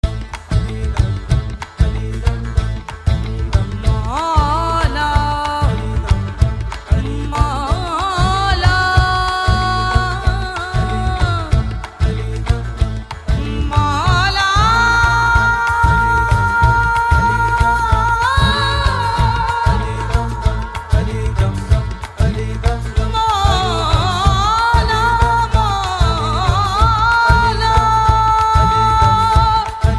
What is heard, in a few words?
Vocal